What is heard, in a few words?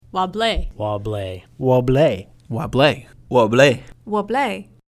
wobble